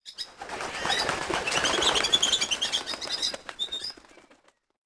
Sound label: rock birds; ambient; World of Tanks Blitz